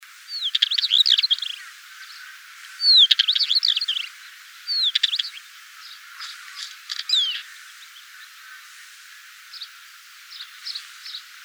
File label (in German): Steinschmaetzer Gesang; uni-kl